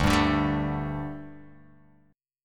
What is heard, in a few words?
D Suspended 4th D, Guitar, Open F; strummed